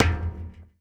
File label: Street Ball Metal Back; SFX Kit; Street Basketball